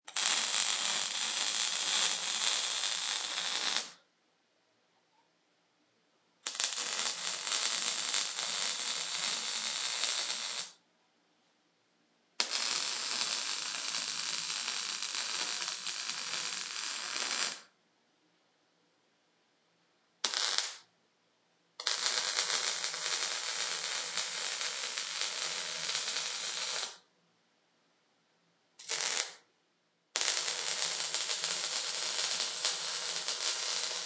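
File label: Weld Torch Multiple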